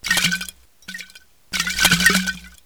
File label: water